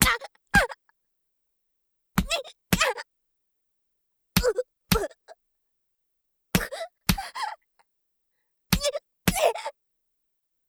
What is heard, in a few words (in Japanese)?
ジャブ BPM110 ワンツー; 効果音あり